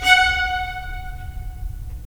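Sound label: strings violins, left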